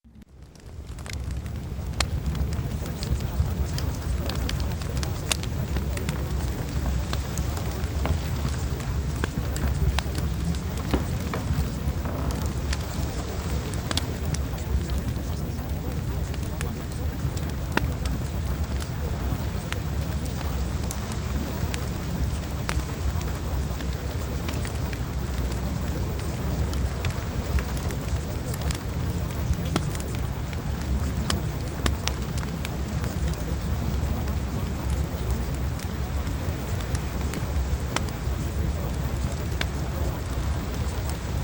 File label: Fireplace